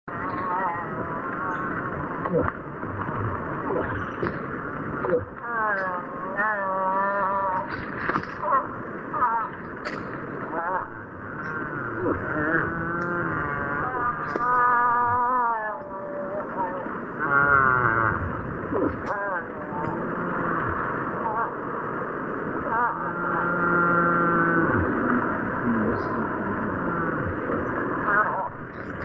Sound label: moose